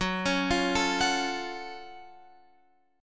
Gb Minor Major 7th Gb down Guitar Open F; arpeggiated

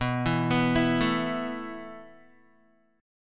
B 7th Suspended 4th B, Guitar; B7sus4; arpeggiated

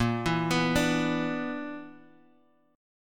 B-Minor Major 7th Double Flat 5th

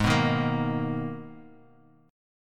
G sharp Minor Add 9th G sharp; madd9:1,b3,(5),9; 4,2,1,3,x,x

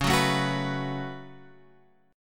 C sharp 7th Suspended 4th Sharp 5th; C#7sus4#5 Chord {9 9 7 X 7 7} strummed

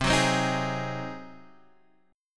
Ab 7th C

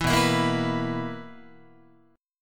B Major 7th Suspended 4th B, Guitar; strummed

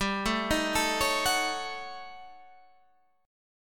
D sharp 7th Sharp 9th Flat 5th

